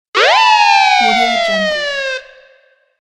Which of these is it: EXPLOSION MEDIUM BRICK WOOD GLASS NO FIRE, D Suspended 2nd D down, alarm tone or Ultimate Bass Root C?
alarm tone